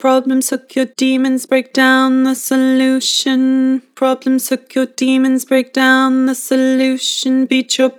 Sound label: Vibrato, Vocals; Beat your problems; Harmor Vocals